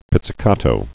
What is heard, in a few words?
pizzicato